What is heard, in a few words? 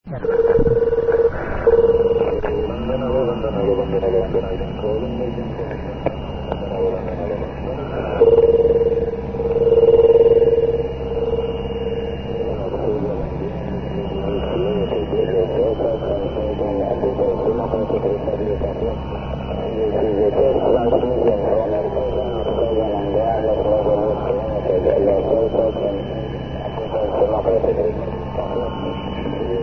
usb